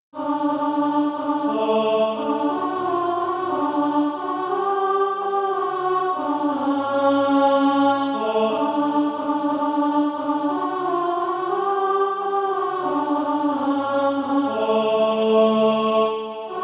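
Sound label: alto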